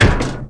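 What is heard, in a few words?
board hit; TrickStyle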